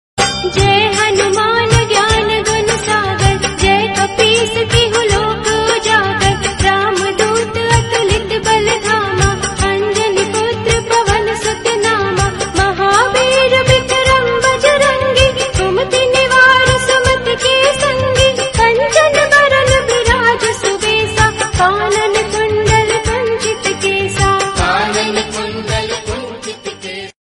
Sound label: Hanuman Chalisa Female Ringtone